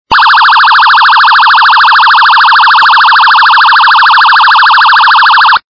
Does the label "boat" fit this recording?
No